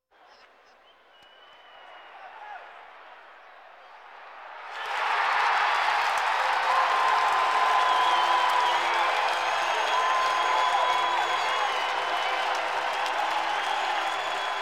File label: Sports crowds